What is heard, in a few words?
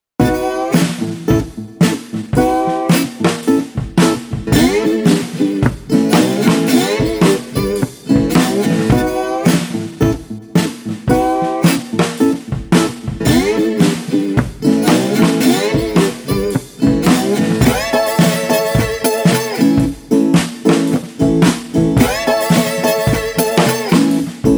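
Street Resonator Guitar Snare Gratified, 110 bpm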